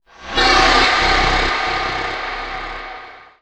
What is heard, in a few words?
TECHNO FX 140